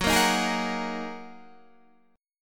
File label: F sharp 6th Add 9th F sharp Guitar DADGAD